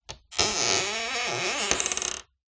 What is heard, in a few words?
household door creak